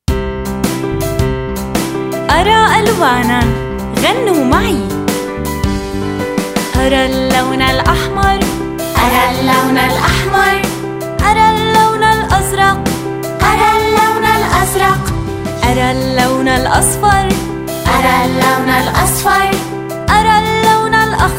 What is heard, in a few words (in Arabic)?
أرى ألوانا Chant